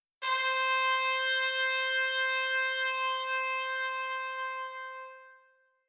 Tp F longtone

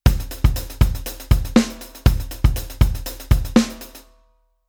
Rhythm 16beat

